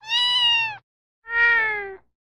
Kitten, alarms; LG Qua tab PZ LGT32

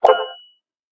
Text Alert; Tinno ATT Calypso 3

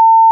ui bonktone; Motorola ROKR EM35; ui sounds

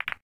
lock; LG; Wine Smart; circlemosaic

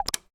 Unlock, phone ui; HMD Skyline India

